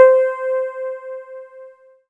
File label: C5, Synth Line; NiceBeats